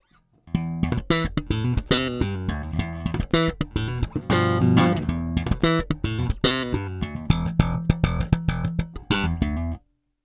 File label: Both full slap